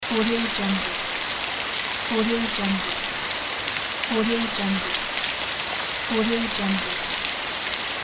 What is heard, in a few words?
rain; tatreal